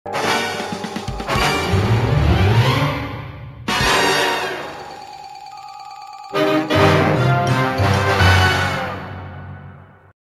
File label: Battle End LOSE